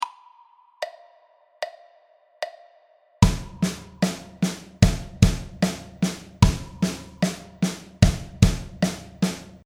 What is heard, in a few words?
4 way bpm 75, drum